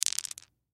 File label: Dice Throw Down Cement; Casinos